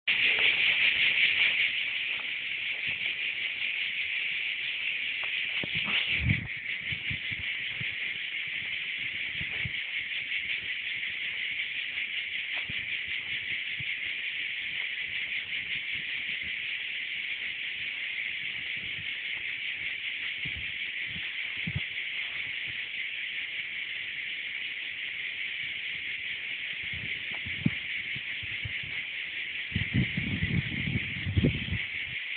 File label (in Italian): cicale